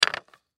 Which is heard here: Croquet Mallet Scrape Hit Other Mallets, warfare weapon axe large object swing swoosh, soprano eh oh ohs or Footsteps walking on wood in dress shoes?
Croquet Mallet Scrape Hit Other Mallets